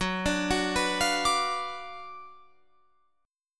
Gb Major 7th Suspended 4th Sharp 5th, Guitar Open F; GbM7sus4#5 arpeggiated; 18,21,18,18,20,21